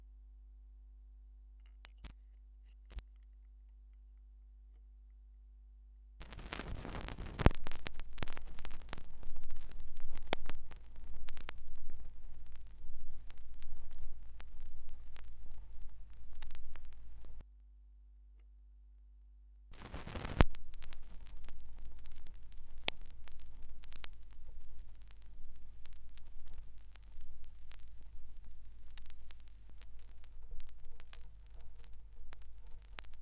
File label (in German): Schallplattengeraeusche; Vinylknistern